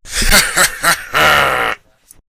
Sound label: evil laugh filtered